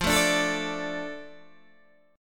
F 6th F down Guitar Open D

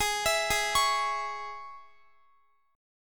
Gsharp Suspended 4th Sharp 5th, Guitar; arpeggiated; x,x,20,21,20,21; 4F,4A,5C,5G,5C,6E

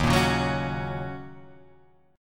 Eb Minor 7th Eb Guitar Half Step Down

